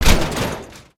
BIG SUSPENSION BNC, vehicles; aronwk collection; ndaudio

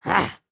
Run; Lego Strombie; creatures organic

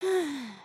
sleep turn; emotes

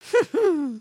breakdance laugh; emotes

